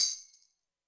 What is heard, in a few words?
shaker, Zoom MRT-3B